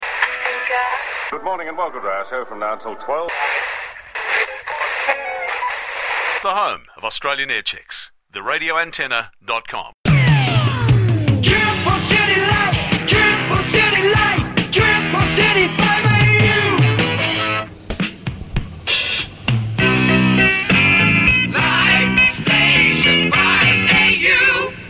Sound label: Aircheck jingles; 5AU; late 70s